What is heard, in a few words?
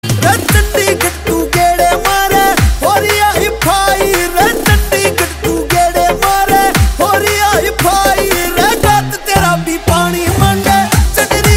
Ringtone